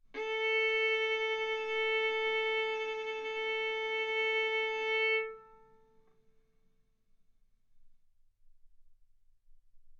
Violin arco A4 B4; mf; stereo

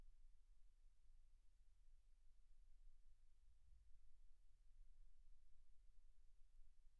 silence 48kHz

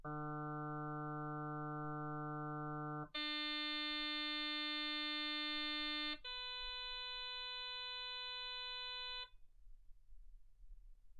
harm sinus 48kHz

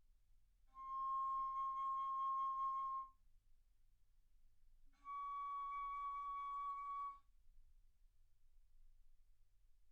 Bass Flute C6 Db6

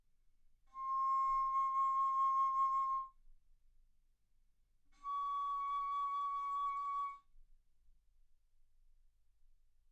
Bass Flute ff C6 Db6

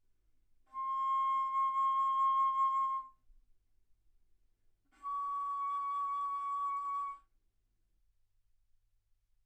Bass Flute C6 Db6; ff

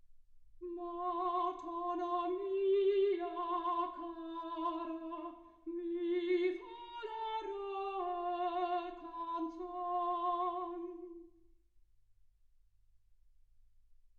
soprano

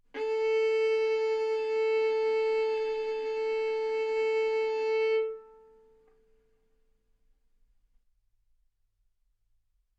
Violin arco mf sul A A4 B4 stereo; single mic

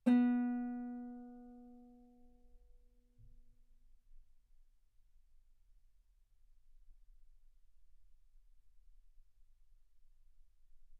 Guitar ff B3; sul B

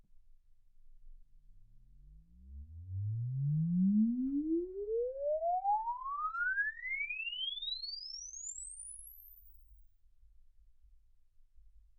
exp swept sinus 10Hz 24kHz

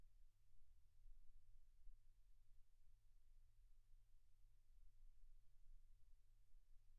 silence 48kHz

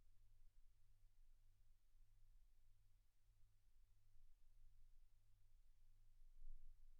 silence 48kHz

